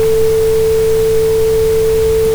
sinus 440Hz

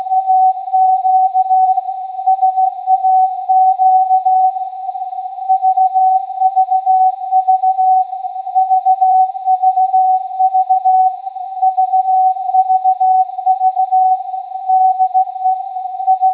saq audio abstimmung

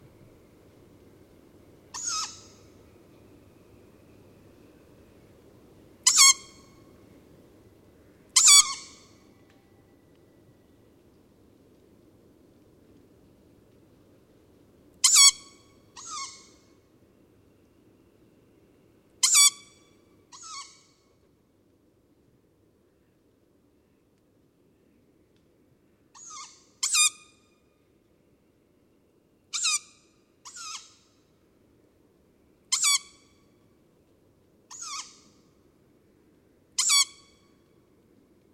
2 junge Waldkaeuze

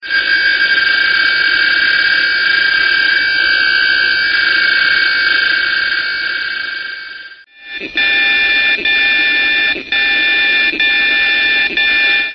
DCF77 Direktmischer

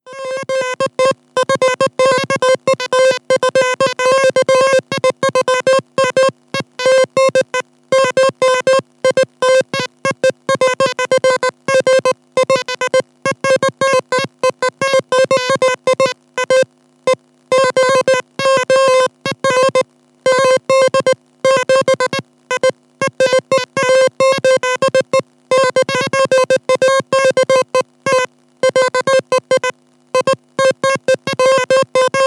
FlackerLED oder Androidenunterhaltung

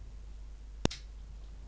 klick

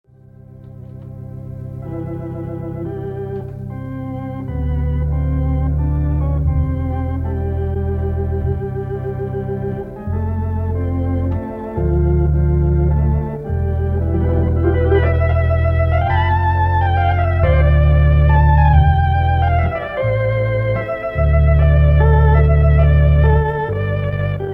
Eminent Heimorgel

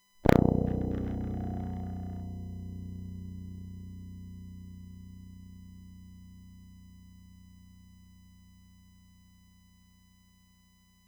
H Saite

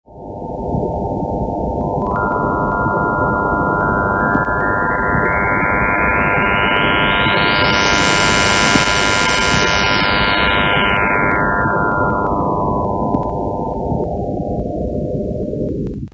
Trafo noise

